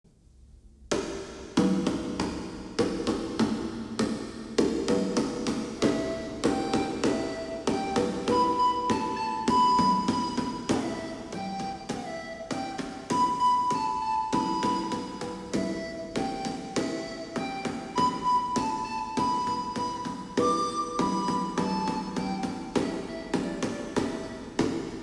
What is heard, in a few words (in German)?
Indianertrommeln; Niklas